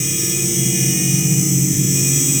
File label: Steel Strings; Sonic Foundry (Sony Creative Software); Myths of Technology